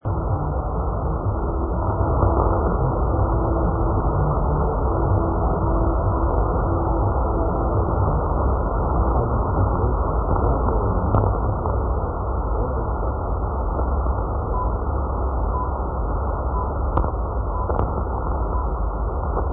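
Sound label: Romania, radio; R Actualitati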